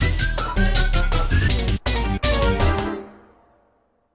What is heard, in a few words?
unused stage clear; Hotel Mario